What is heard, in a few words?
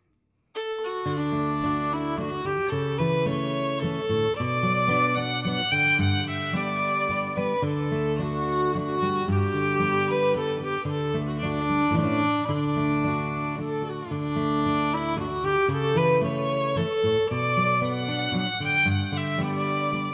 violin; hewlett